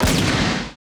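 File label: laser gun; Total Control - Action Cutz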